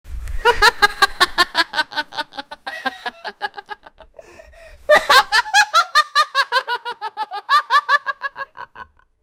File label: a family laughing